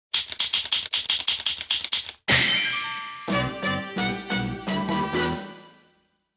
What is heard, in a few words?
Typewriter